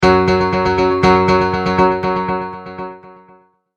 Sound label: Multimedia Tone-Thinking/Processing; Tones; Sound Design; McKinney Sound